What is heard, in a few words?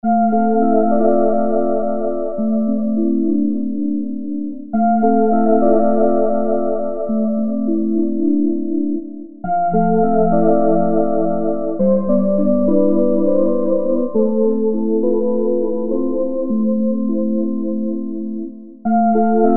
Atmo E Piano; Vintage; Green Oak Crystal